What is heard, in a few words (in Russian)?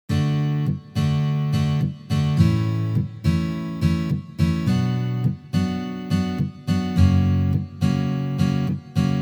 boj na gitare